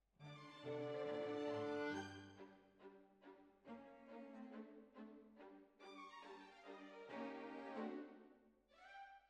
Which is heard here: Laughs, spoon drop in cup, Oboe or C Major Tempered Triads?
Oboe